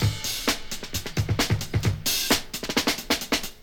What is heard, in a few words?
Breakbeats